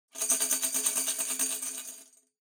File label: Tambourine Roll